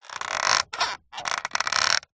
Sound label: plastic creak stress; zapsplat; foley